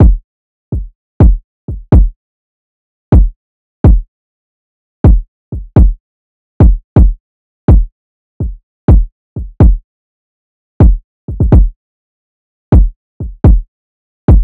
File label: Drum Loop - 125 BPM Kick; Cymatics; Antidote Drum Loop